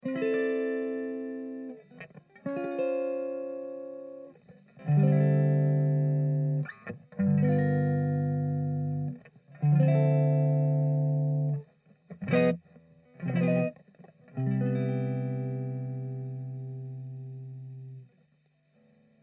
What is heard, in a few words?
C100 Jazzy GTR Intro Outro